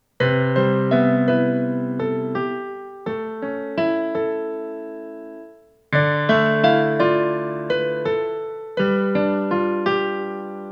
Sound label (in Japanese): on piano; Lizsoft Music Works 15th Anniversary Soundtrack